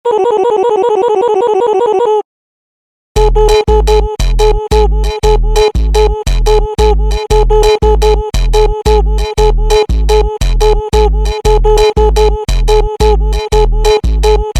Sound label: Sombra Boop Funk slowed